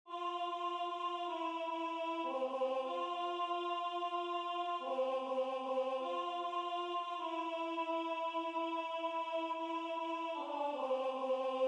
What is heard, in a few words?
Alto; LA VALENTINA